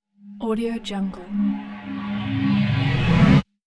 short reverse with no tail; hollywoodedge

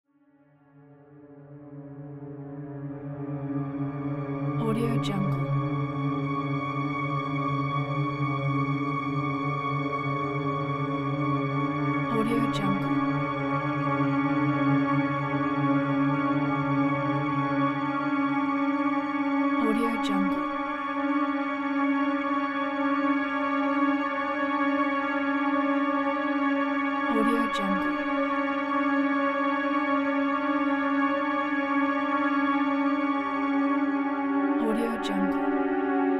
suspense; dauzkobza

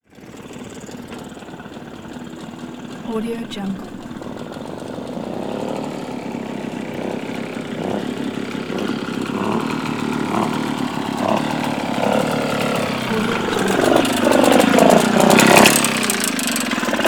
scooter moped 2 stroke

